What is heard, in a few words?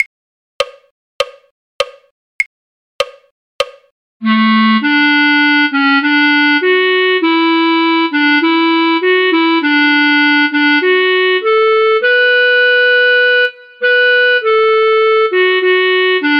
clarinet; auld lang syne; folk